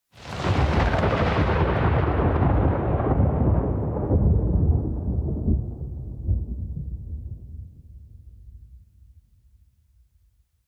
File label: lightning; sequence; VistaLightning